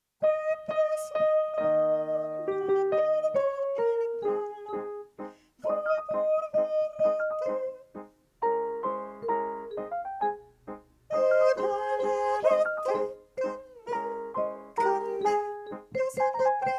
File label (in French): Tutti rapide